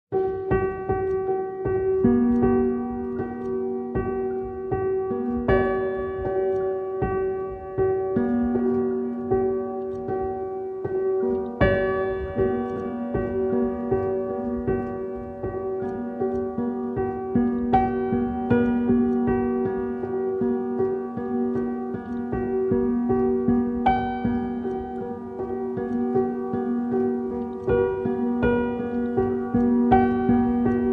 Pianos Only

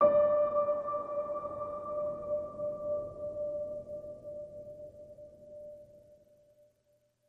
Piano Ping D

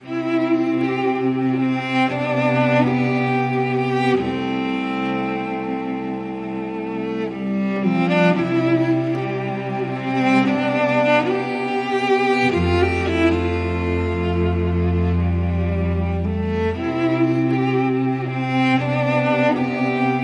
Cello

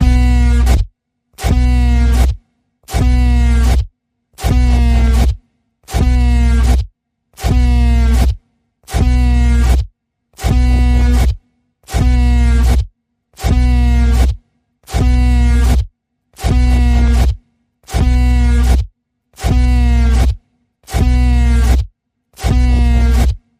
Panning Pulse B, Key of B

